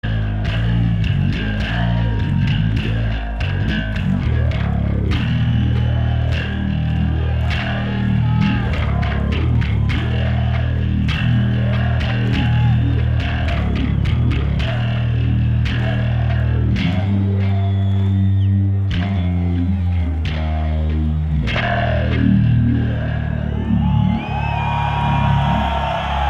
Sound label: Bass Solo